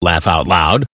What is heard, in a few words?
laugh out loud